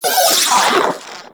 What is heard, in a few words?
Vocal Death; Warframe; Corpus; Arisoctrats; Mini Moa